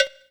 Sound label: Ensoniq SQ-1 Cowbell